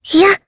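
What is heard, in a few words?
Vox Jump Japanese; Jane; kings raid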